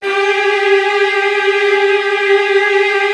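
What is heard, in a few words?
Virtuoso Strings, violin trills, G2; ILIO; Kirk Hunter Virtuoso Strings VOL-1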